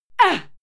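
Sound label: female fight attack hit soft, screams; schreie; menschen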